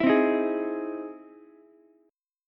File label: E Augmented Major 7th E down Ukulele; strummed; E+M7; JGuitar